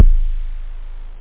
tr 808 kick; kicks

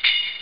tambor, drums cymbals; OctaMED SoundStudio V1

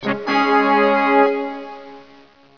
fanfare; Caesar3

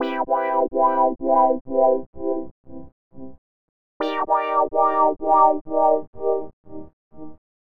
Synt; SYNTH; 120 BPM

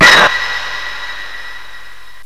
stab fx, techno; Terra Sound Library; stabs

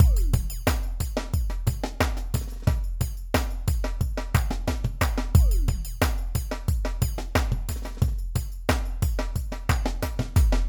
Breakbeat; syntonicgenerator

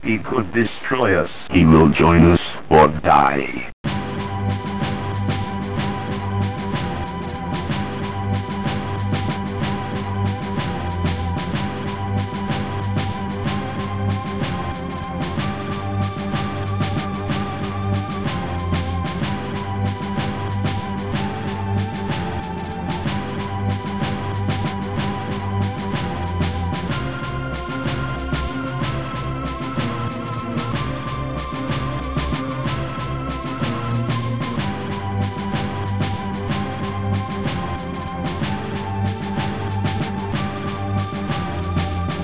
tune; Protracker Module; flashback-fin2; Protracker and family; Protracker M.K.

